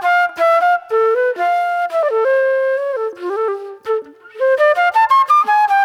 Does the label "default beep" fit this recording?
No